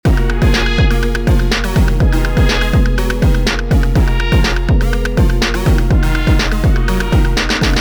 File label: bee beez BUZZ